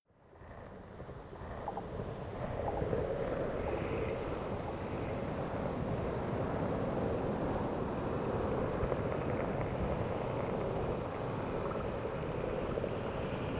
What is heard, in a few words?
water fx windy